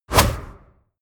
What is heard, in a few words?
push